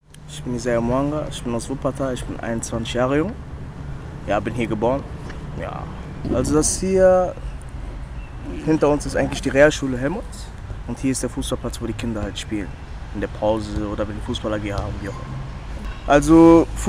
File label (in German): Fussballplatz Carnapsplatz